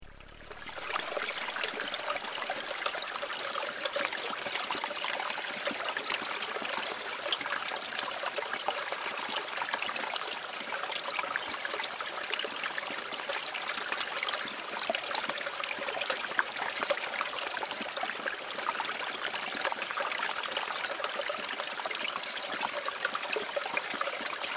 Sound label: water pouring